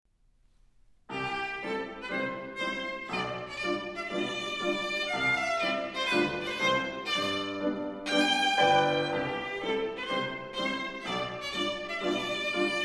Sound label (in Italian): Sonata per la Gran Viola e Orchestra